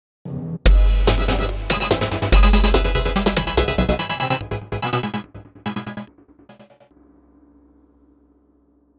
Platform Success; Remember Me

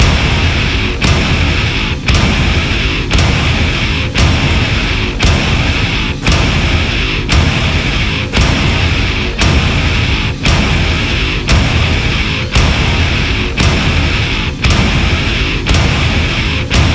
86bpm Layer

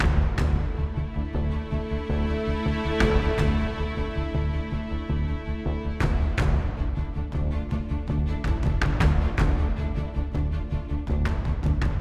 Mood Mid

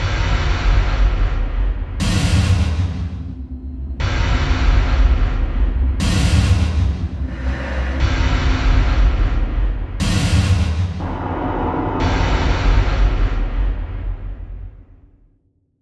Danger Tonal; Insanely Twisted Shadow Planet

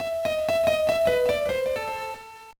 Jingle, gba; GetBackers Dakkanya Metropolis Dakkan Sakusen

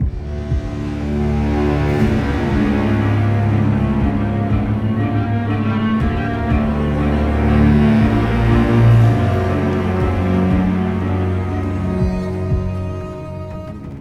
String; Assassin's Creed Unity Dead Kings

